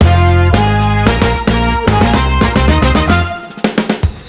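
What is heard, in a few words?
Boss Stage Transition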